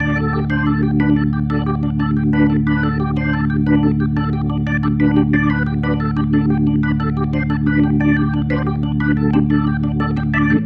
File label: Pattern, Organ